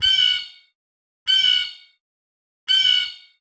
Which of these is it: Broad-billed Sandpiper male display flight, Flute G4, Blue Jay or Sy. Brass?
Blue Jay